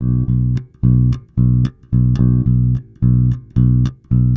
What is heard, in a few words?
110 Pop Basic Bass B, E-Bass; Pop Rock